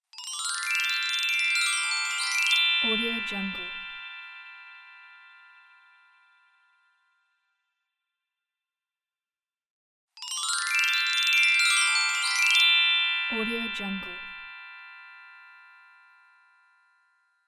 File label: magic xylophone